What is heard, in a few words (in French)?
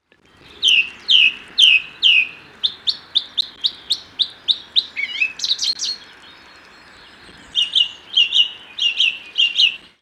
grive musicienne